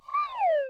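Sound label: Birthday-Card Balloon-Rise Reverse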